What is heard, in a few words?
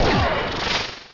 Simple Beam; battle anims; PokeRogue